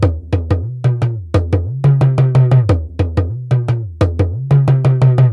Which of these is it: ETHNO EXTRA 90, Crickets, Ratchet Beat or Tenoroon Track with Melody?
ETHNO EXTRA 90